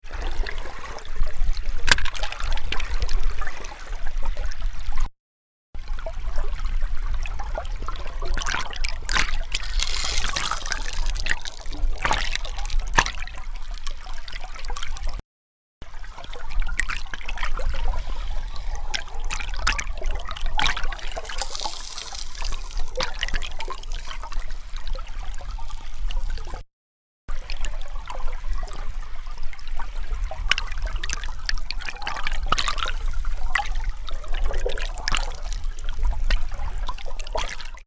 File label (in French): hydrophonie cailloux